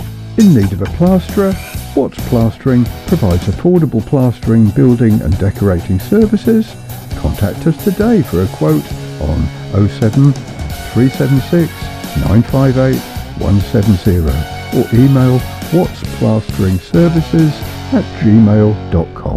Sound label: Plastering jingle; Watts Plastering; Bexhill Radio